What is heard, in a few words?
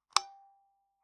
Taxi Meter